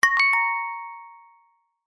multimedia alert chime short musical notification cute child like